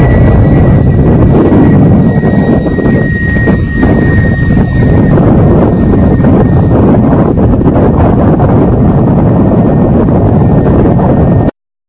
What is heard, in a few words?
f-16 takeoff